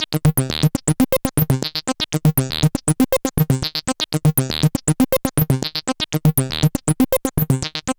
Synth Sequence; Sonic Foundry (Sony Creative Software); Prototechno

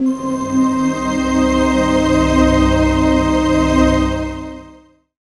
Pad Mood; Zero-G Jungle Warfare 1, 2 & 3; 90 s Sample CDs

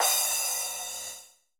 cymbal, Roland MC-909

